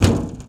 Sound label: metal auto, player footsteps